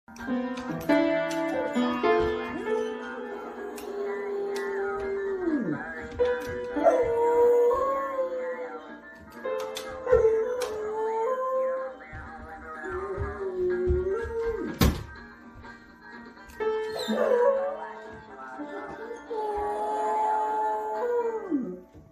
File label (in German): Hund und Klavier